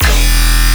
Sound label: Bass Shot Metallic E; Jino Bass Shot Metallic2 E